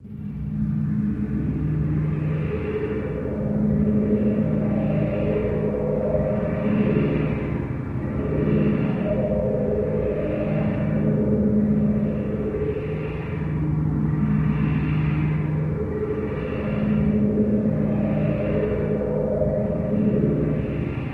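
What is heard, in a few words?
Space Amb Background, sci-fi ambience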